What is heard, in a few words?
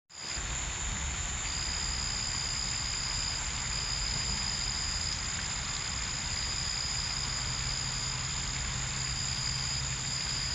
southern summer evening ambience with crickets; bugs